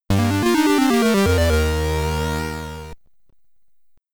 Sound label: Get Ready! (SID Stereo); Commodore 64